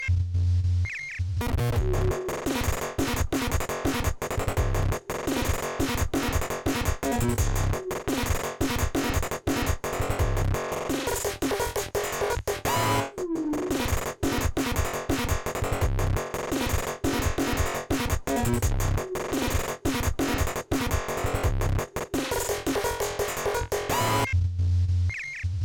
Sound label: drumy